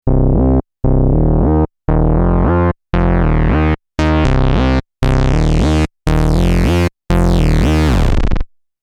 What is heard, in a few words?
Minimoog, Bass; PC3